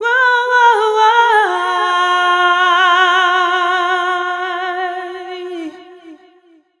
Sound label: f 126 mel f-sharp, fem Vocals